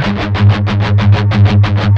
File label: 16TH, Guitars